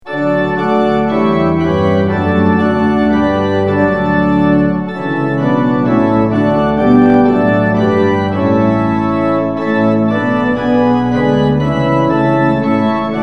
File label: tune; hymns; lillenas